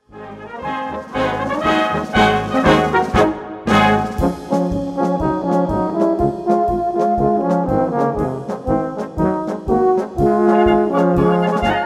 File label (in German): Tenorhorn Polka